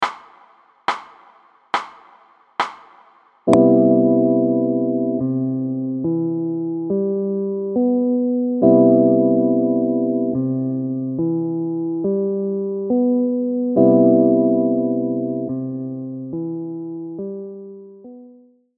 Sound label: chordtone